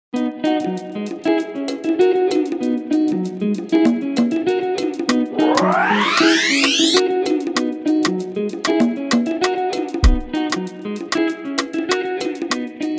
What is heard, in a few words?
monkey size 97bpm